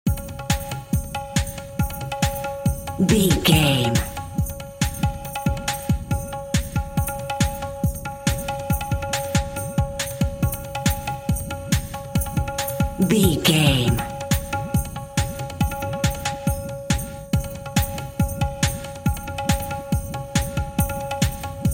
egyptian video game level drums only

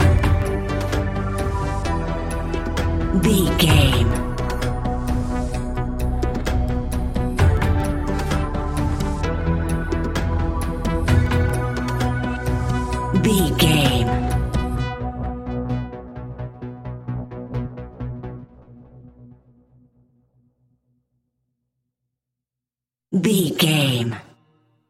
atmospheric synth tension

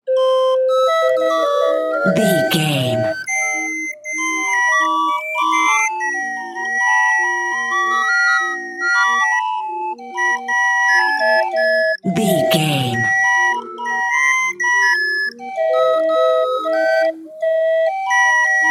mexico city organ grinder

